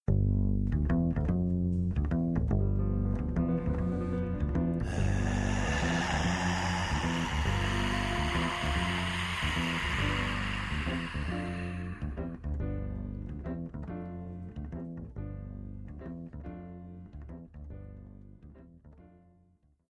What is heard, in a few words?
B Sharp Ringtone